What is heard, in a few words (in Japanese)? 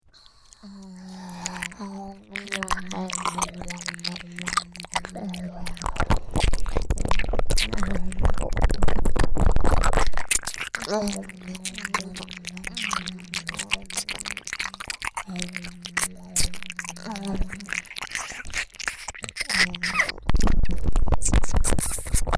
左右同時耳舐め